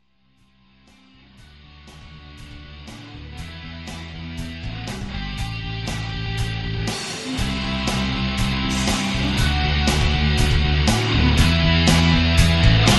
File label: heavy guitars